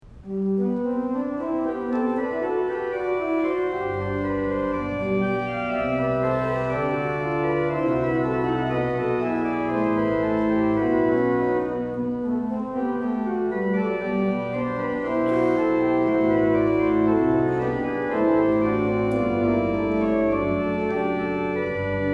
Chorale Preludes on Allein Gott; Op 67; Rheinberger

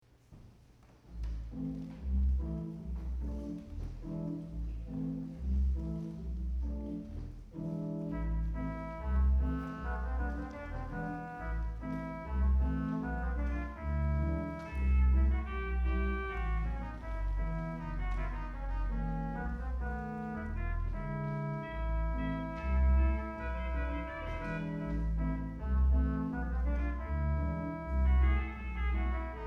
Cansone A Minor

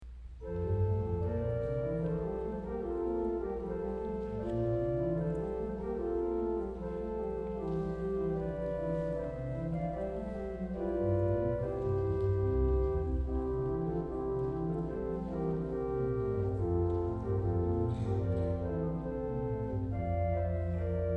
Variations to the Sicilian Hymn, organ

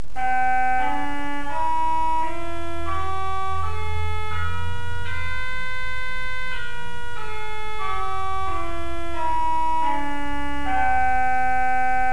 hautbois, organ; reed pipes

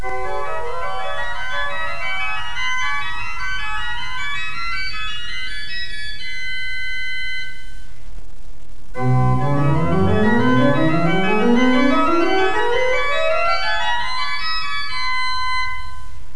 mixture, organ

